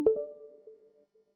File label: echo beep idle